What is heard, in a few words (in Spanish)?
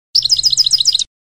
floreo tovi tovi, campero